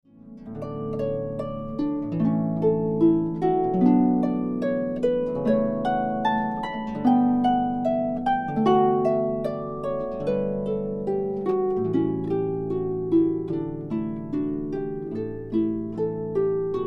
Solo Harp

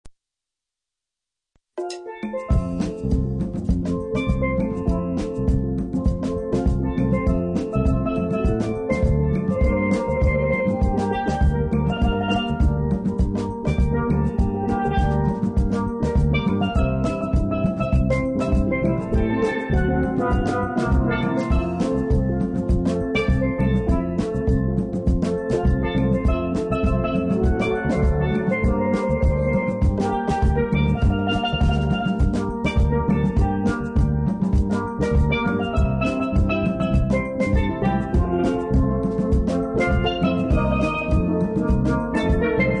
Acoustic Steel Drum Band; caribbean

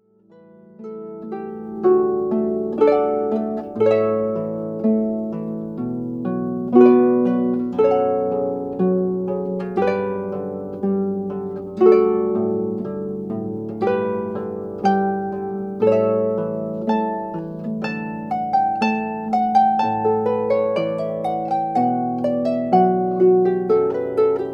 Cannon In D, harp

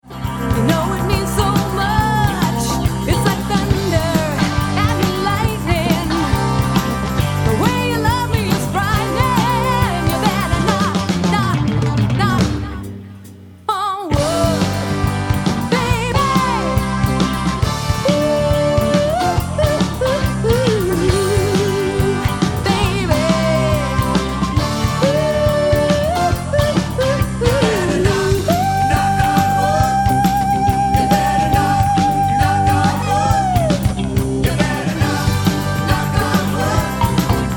Live Band

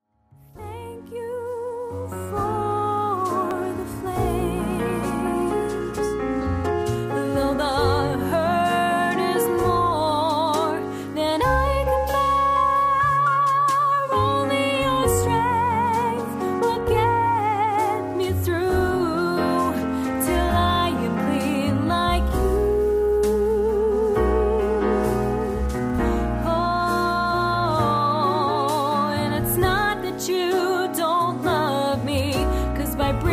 voice piano

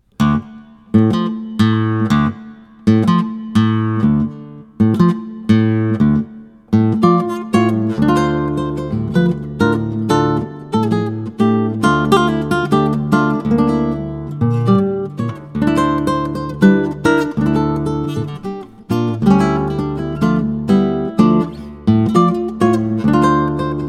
Solo Guitar; Flamenco; Habanera

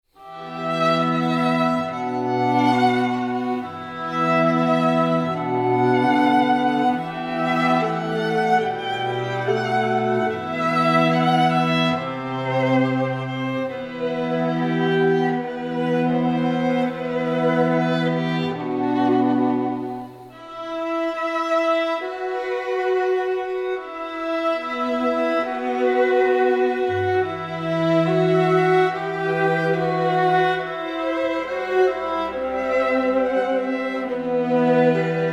String Quartet

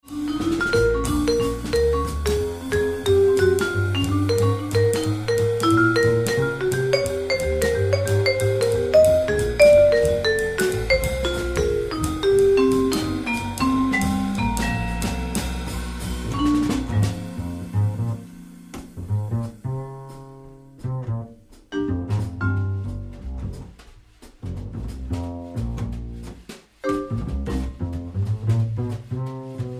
jazz band

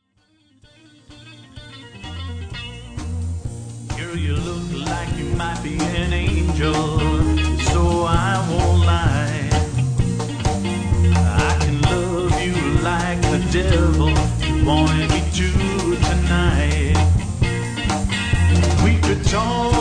Country Band

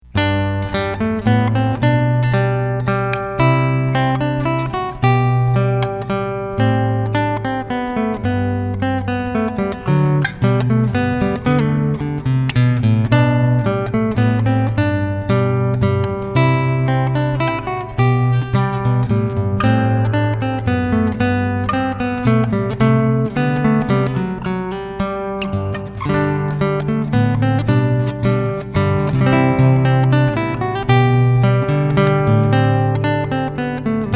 Minuette, Guitar; Bach

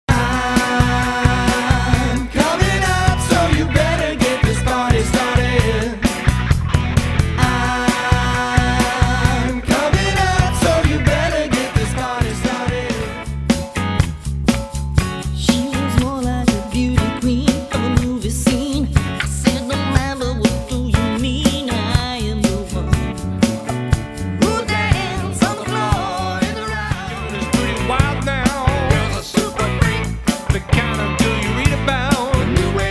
Dance Funk Disco; Music

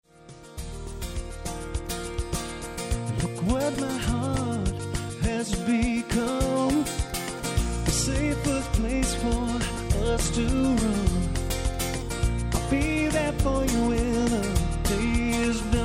LIVE, Acoustic Guitar

